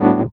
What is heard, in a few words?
E.PIANO, KEYS